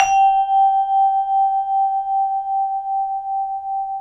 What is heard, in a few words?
VIBRAPHON; ILIO